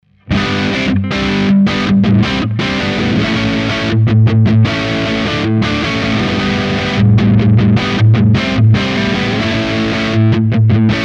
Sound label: Les Paul Midgain Rhythm Neck Pickup; Slash Signature